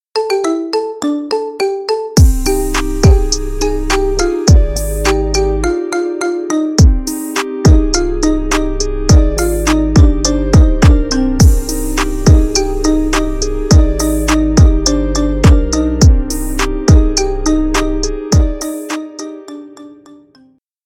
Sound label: marimba remix